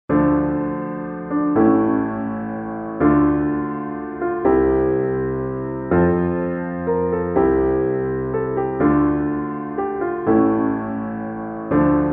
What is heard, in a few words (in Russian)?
Fortepiano